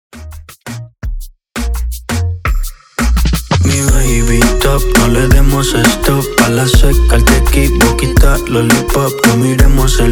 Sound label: Intro Chorus 84Bpm